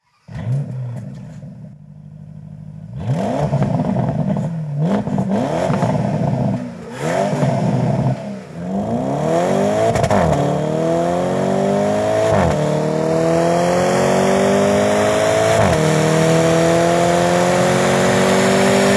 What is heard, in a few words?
Stock exhaust Porsche Panamera Turbo 971